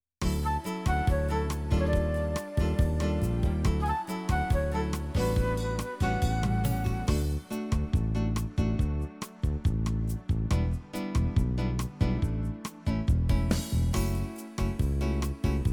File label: Bossa Nova